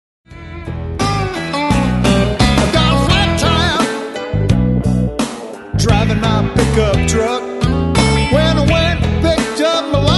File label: pickup